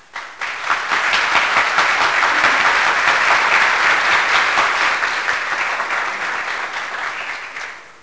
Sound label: APPLAUSE; golf